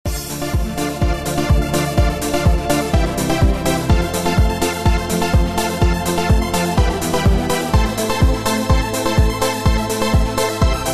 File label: ringtone